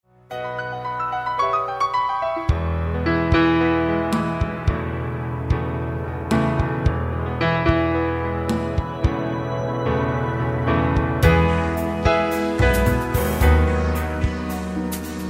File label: Warm Caring